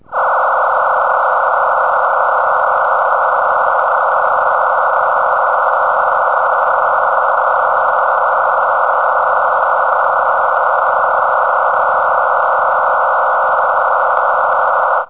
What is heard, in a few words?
mt63-c